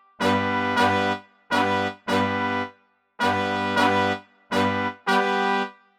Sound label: horn stabs